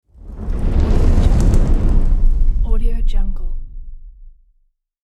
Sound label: meteor fire whoosh